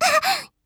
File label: gasp; faris